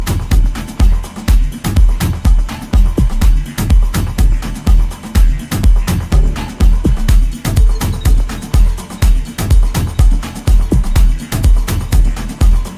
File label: ALT INST